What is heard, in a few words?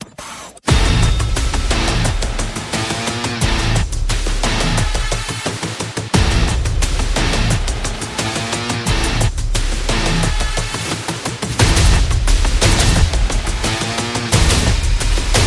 Military